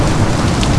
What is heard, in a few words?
rain